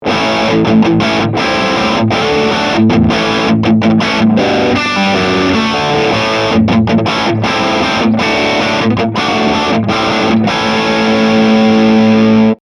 mesa boogie mini rectifier twenty-five channel 2 vintage riff